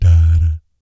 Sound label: "BS DA DAT", vocal jazz fx